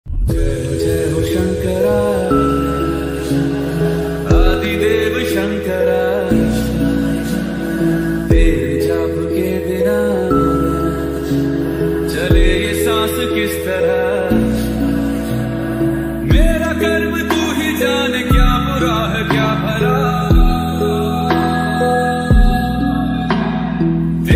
ringtone song; mahadev

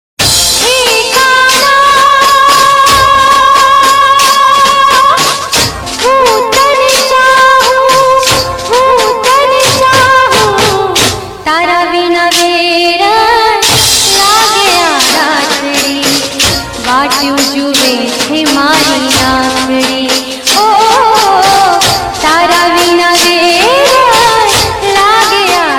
gujarati garba audio ringtone

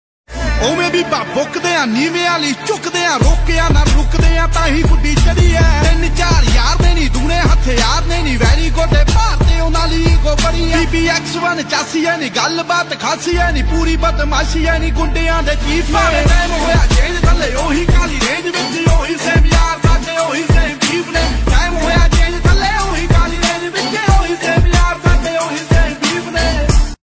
attitude punjabi ringtone

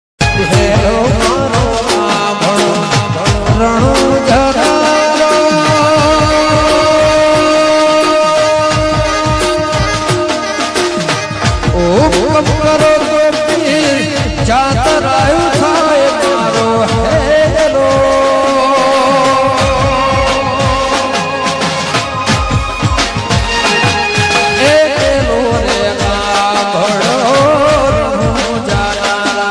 ringtone gujarati; ramdev pir

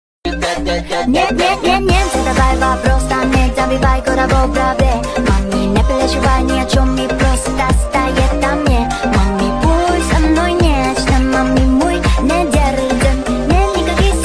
tik tok ringtone background music

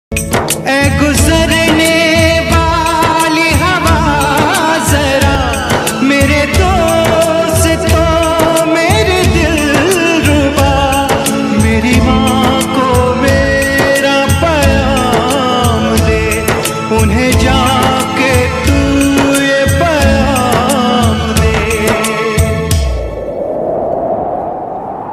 desh bhakti flute ringtone; zedge

